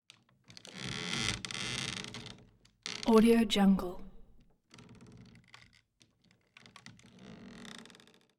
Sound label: wood floor creaks